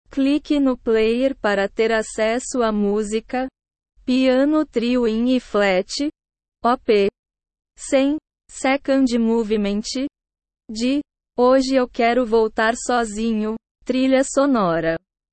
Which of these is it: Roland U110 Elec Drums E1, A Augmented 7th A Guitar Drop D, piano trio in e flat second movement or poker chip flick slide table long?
piano trio in e flat second movement